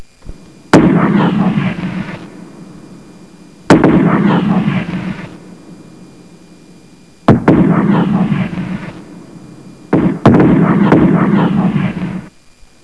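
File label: artillery; freeciv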